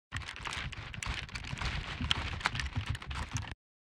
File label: Ranger movement run